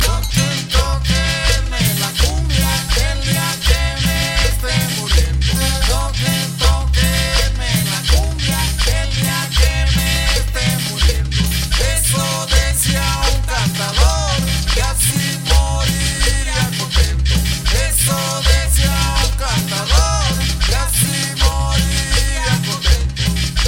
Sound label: Cumbia